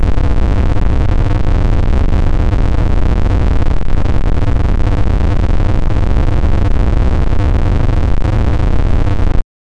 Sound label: pulsing digital drone; timbre